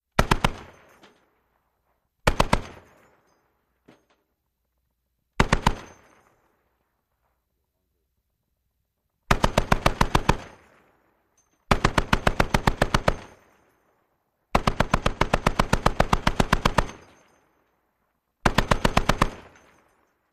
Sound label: .50 Caliber: Long Bursts With Shells Dropping